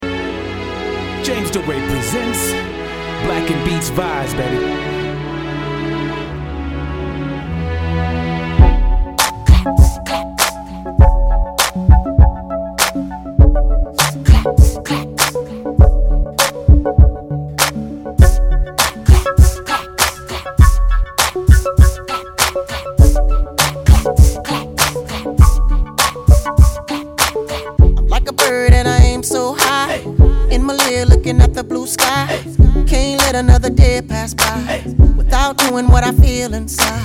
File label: Clap Again